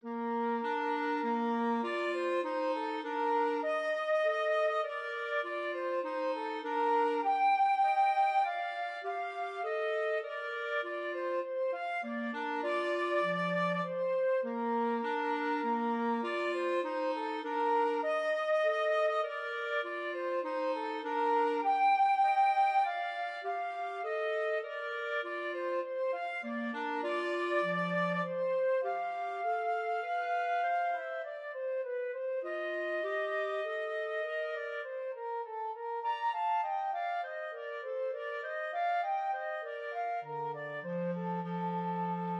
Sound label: flute clarinet duet